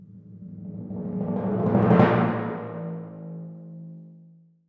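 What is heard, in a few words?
timpani roll crescendo a1; Percussion; Sonatina Symphonic Orchestra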